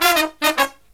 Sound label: Killer Brass Riffs, 108 soul